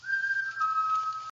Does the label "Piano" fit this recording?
No